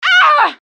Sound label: Pain female